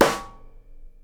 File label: SNARE, Live Snares